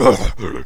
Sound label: pain; player